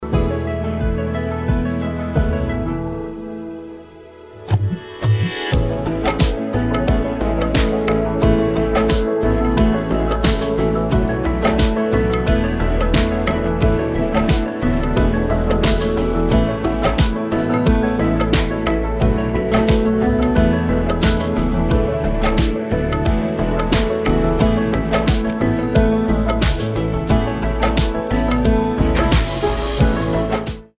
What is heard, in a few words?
Karaoke Song